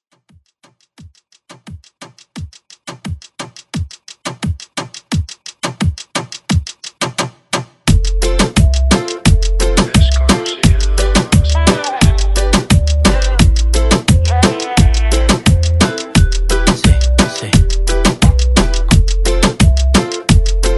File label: Reggaeton; Redrum